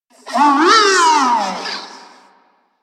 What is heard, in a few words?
Necramech Repair Drone Idle Surprised; Warframe